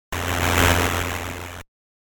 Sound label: race Car; Frogger; Arcade